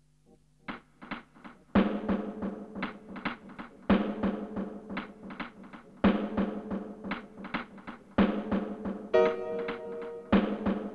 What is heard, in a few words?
instrumental